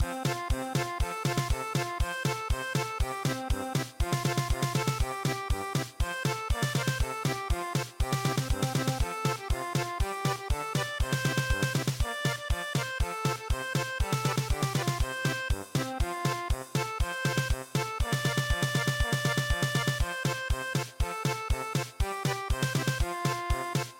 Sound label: German Polka